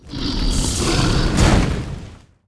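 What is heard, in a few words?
trap attack, monster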